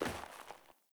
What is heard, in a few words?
Sandals Land Snow, Footsteps; Characters; Player